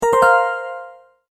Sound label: multimedia tone advance; Tones; Sound Design